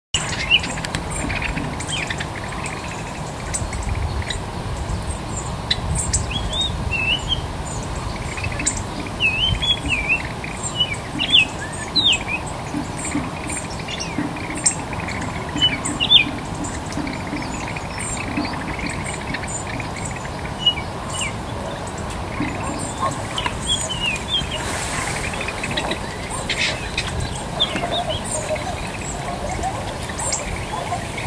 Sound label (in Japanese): ikaru koe